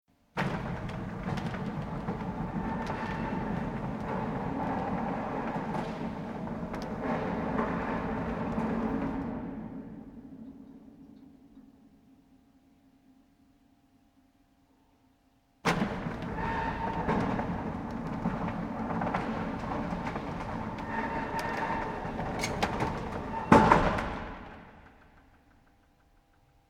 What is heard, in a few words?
Industrial Mechanical Electric Door Large Close Perspective External Closed Variations; Metal Door